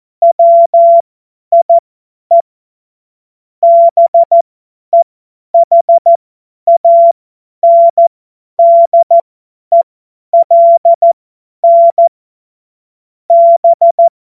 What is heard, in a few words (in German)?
Witz, cw morsen; Tempo 50 F70